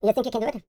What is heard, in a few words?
YODEL YELL, World Voices; ILIO; Vocal Planet VOL-5